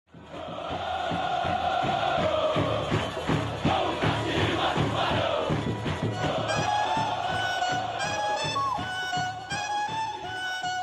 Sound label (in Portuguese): arrocha funk; parnahyba sport club; nathandobeat